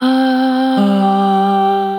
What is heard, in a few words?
Chromatic Voice; Chromatic Voices; Basic Voices; Ableton; Factory Packs; Voice Box